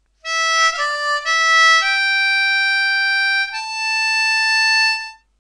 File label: 10 hole harmonica